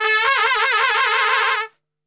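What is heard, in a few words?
A trumpet shake; Best Service; Brass Super Section; TRP SHAKE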